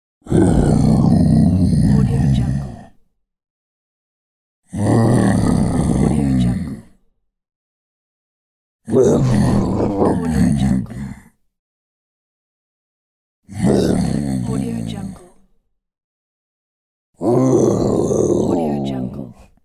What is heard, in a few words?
cartoon bear grunts growls